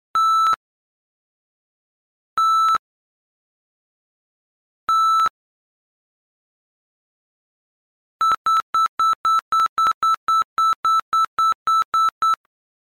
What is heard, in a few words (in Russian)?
pisk metalloiskatelja